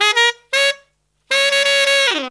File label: 160 BPM, Horns; 90 s Sample CDs; Zero-G; Phantom Horns